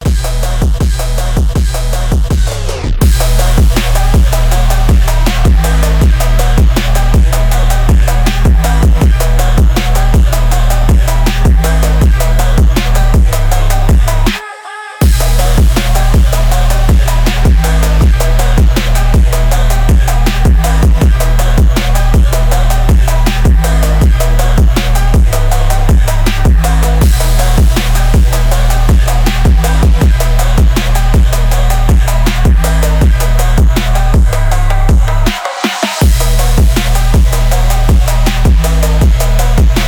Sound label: night phonk